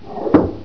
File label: Rolling Switch